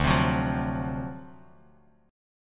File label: D 7th Sharp 9th, Bass Standard; 10,15,16,10; strummed; JGuitar